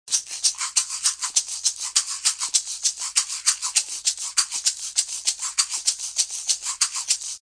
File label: LP VARI TONE SHAKER; shakers